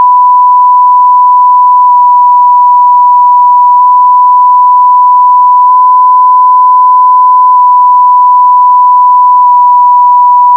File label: 1kHz sinewave, freq shifts; 30000 ppm